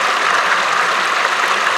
water flow; Dystopia; cybernetic

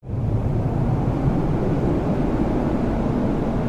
outside; Dystopia; silo

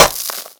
concrete impact, Bullet Impact; Dystopia; physics

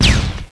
hit, boltgun; weapons; Dystopia

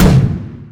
basilisk secondary, weapons; Dystopia

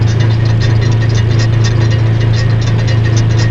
noisy LOOP

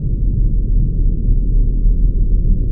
vaccine core; Dystopia

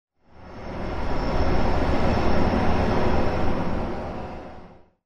distant noise; Dystopia; fortress

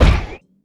heavy grate, footsteps; player; heavyfeet; Dystopia

